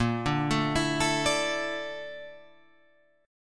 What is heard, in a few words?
Bb Major 7th Flat 5th, Guitar, Open F; 10,9,9,11,12,9; arpeggiated